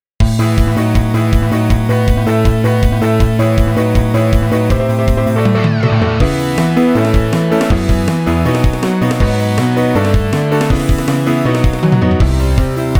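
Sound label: piano with drum machine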